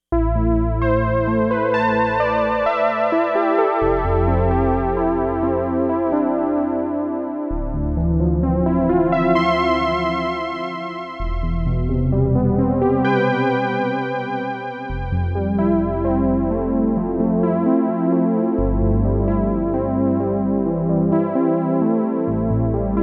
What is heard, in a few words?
Nostalgic Plucks